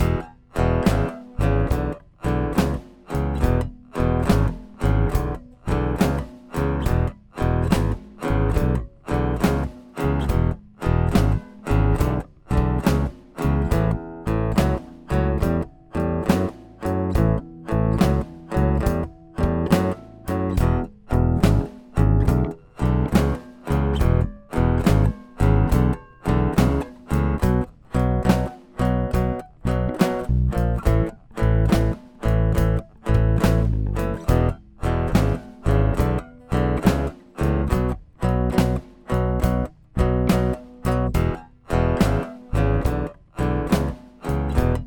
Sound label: Blue Playalong